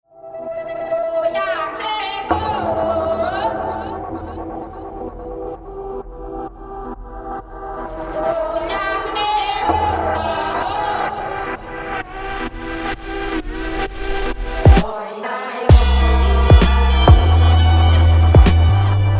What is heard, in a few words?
Blues